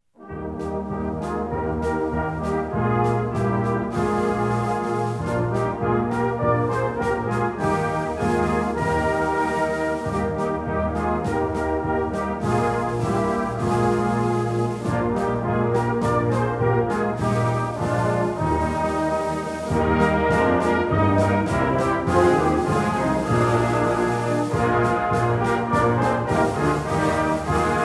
Band

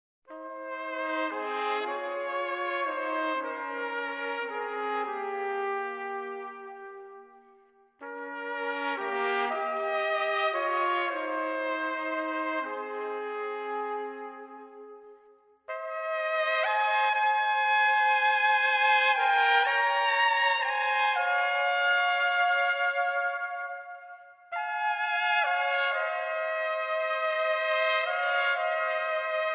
Instrumental

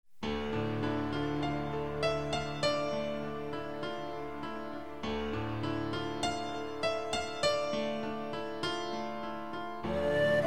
Instrumental

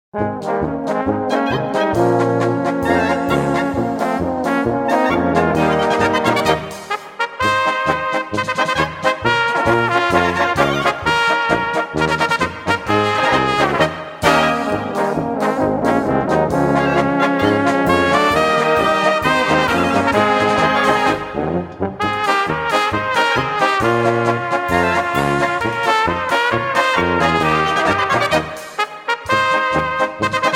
instrumental

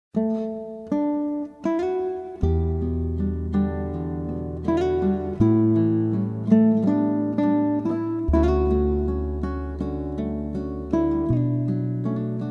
Acoustic Praise Instrumental

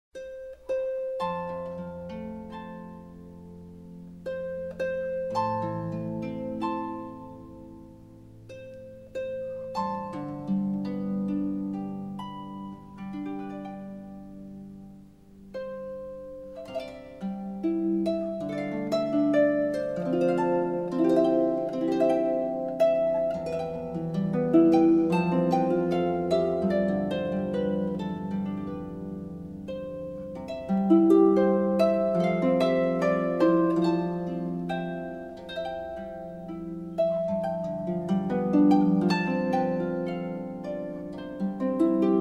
Instrumental Harp Praise

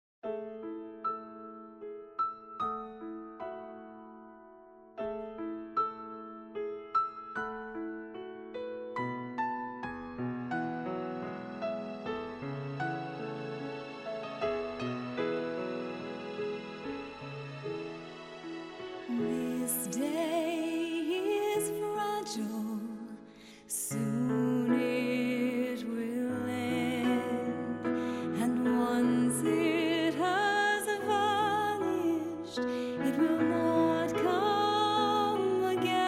Instrumental Praise

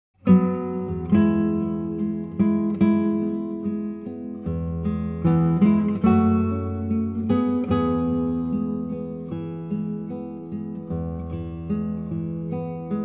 acoustic praise, instrumental

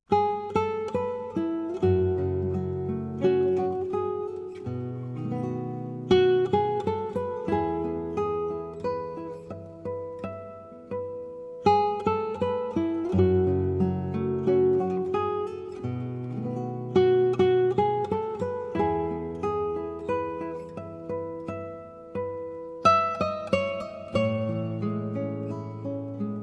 acoustic praise, instrumental

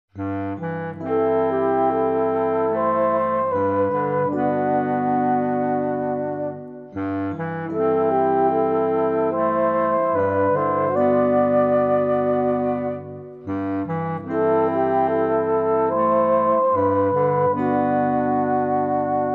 acoustic praise, instrumental

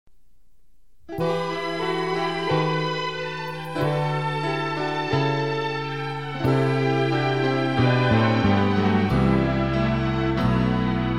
Cello

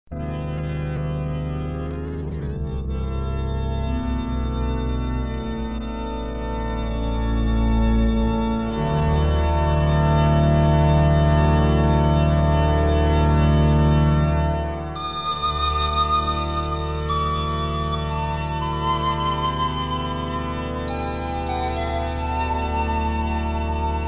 Panpipes

